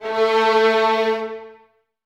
Violins A 3, String Ensembles; Miroslav Vitous

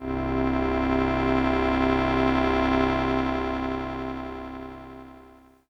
Pad C, Synth Prophet One Shots; Electronisounds

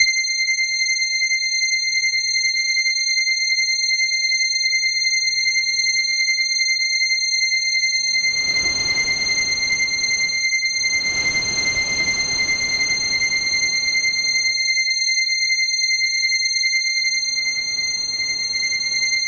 C5, Synth Line; 90 s Sample CDs; NiceBeats; SynthLine VOL-14

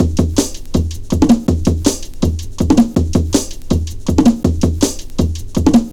162, Jungle Breaks; Peekaboo; Jungle Warfare 1&2

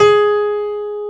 G#3 STR PIA, G.PIANO; AKAI S-Series CD-ROM Sound Library VOL-7

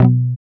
GUI B, Acoustic, Roland

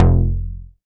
BAS F, SCI Prophet-5 synth bass

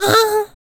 VOICE FX, Dance Vocals

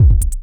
4 floor beat, trance

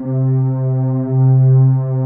ANA STRING, pads; Pro-Rec - Synthsonic

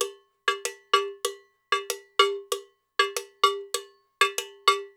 Percussionworks

